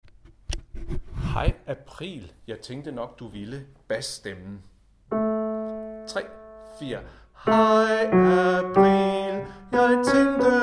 Bas; Hej april!

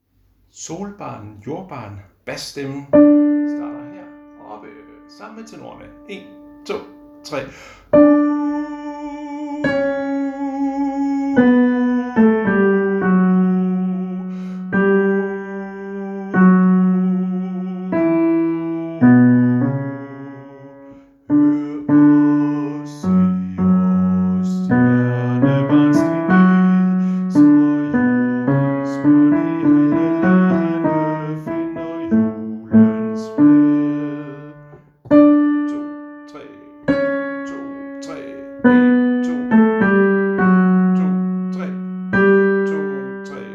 bas; harlev koret

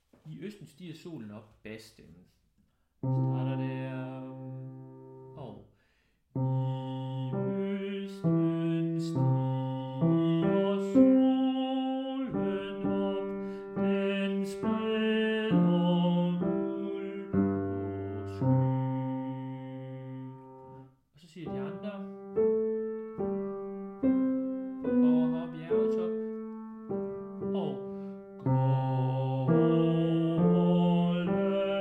I østen, bas; harlev koret

